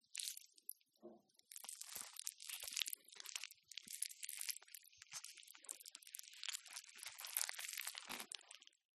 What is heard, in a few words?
GUSH FLESH MOVEMENT; CLEAN; PMSFX